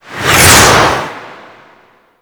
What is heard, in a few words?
Air Rush Slow One Off; vehicles; surface air rush; Archwing